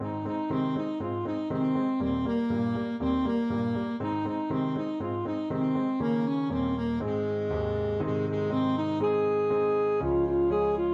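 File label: alto saxophone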